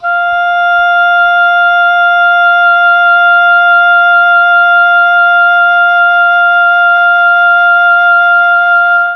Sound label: F3 OBOE, Mellotron; Mike Pinder - Mellotron Archive CD